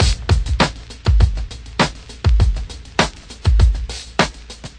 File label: 100 groove clap snare lofi, drum loops and breaks; 091 to 100 bpm